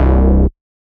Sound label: Bass Shot C